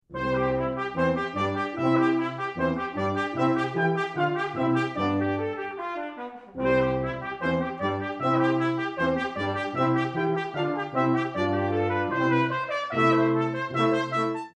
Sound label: Fanfare